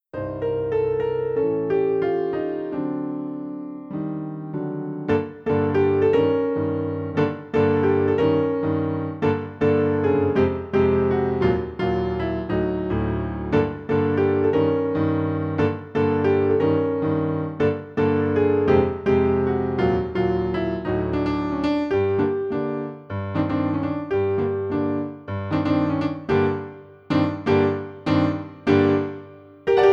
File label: backing